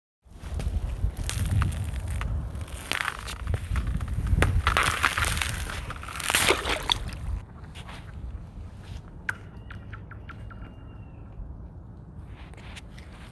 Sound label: Crack bloop skip